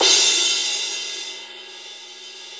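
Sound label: cymbal crash; Sound & Vision - Gigapack I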